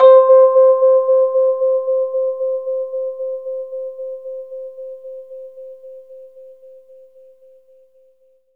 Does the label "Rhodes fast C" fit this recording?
Yes